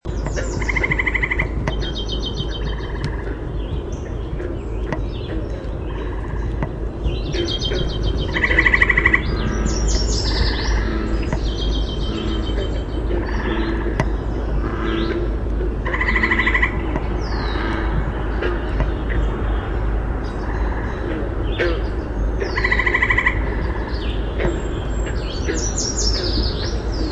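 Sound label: Green Tree Frog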